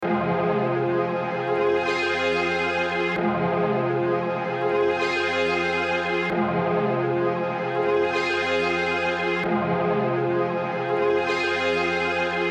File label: F min 153 BPM Atmospher String